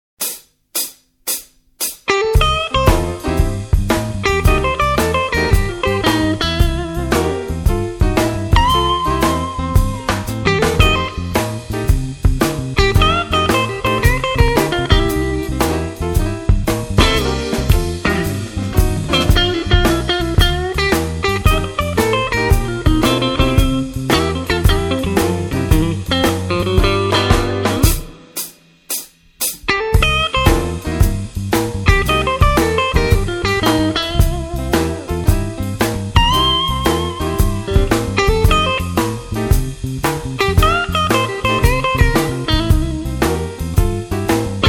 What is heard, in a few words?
blues jazz